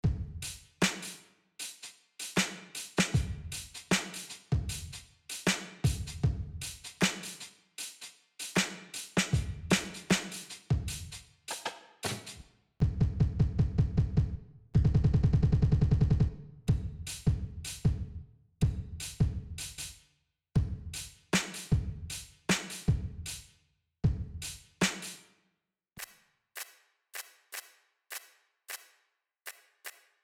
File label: drum stems